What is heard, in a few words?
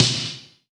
R SNARE, Drum Mashines; EdgeSounds; Drum Mashines VOL-1; R-100 DRUMS